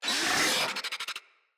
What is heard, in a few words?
Infested Charger Attack, Enemies